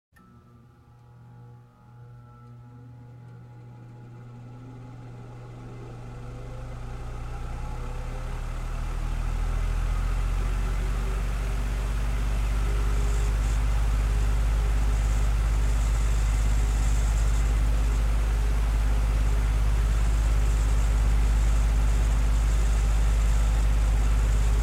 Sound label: Electric Fan 1 Turn on & Off, Household